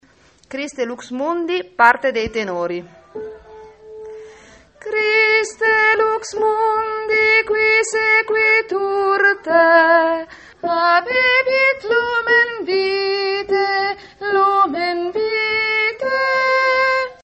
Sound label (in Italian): christe lux mundi tenori